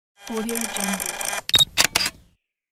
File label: the camera shutter